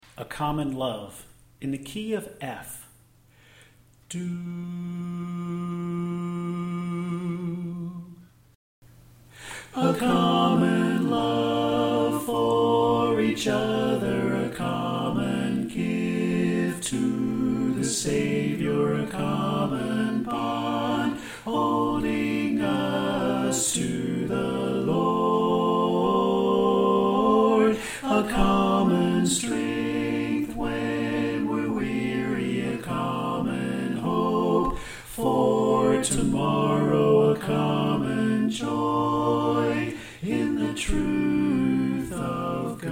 Bass Left

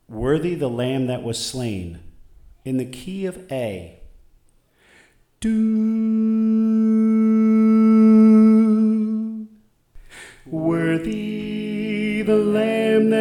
Tenor

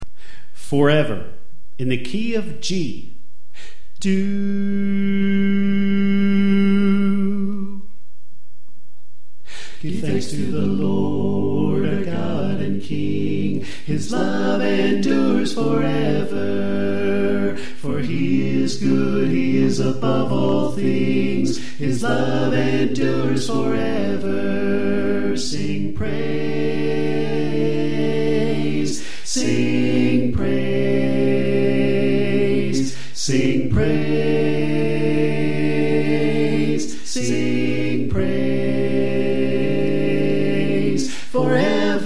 Alto Left

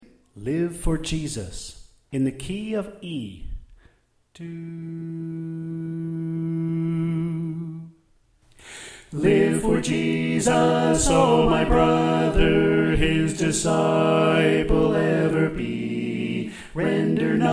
Bass Left

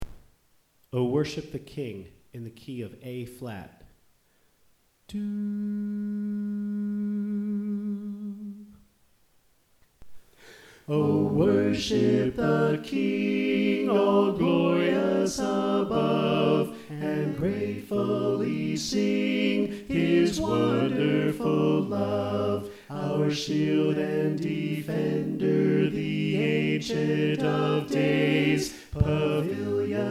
Tenor Left

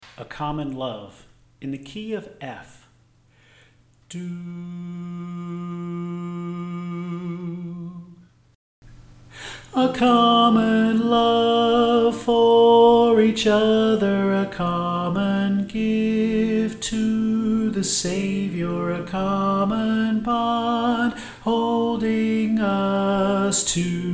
Soprano

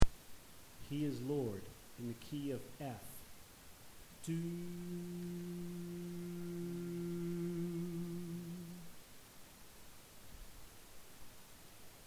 Bass

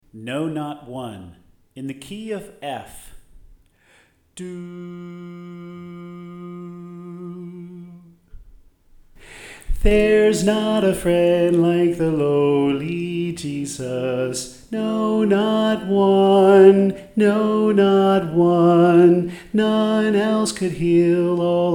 Soprano

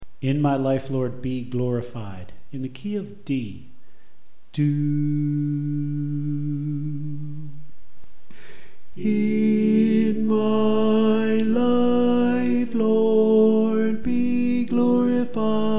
Tenor

